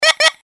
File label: bird croak